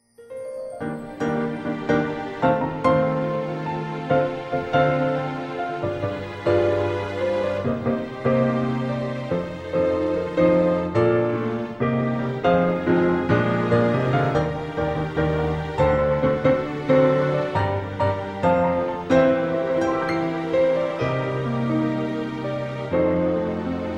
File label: Piano